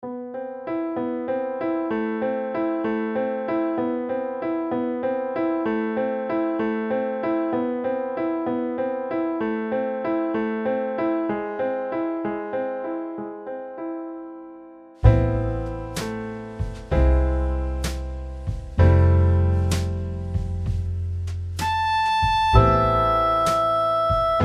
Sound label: jazz quartet